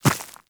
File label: Small Walk Dirt, Footsteps; Enemies; Warframe